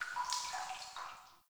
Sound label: Cave Ocean Drip; Ambience; Midrath Island